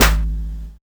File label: Snare, Dubstep One-Shot Drums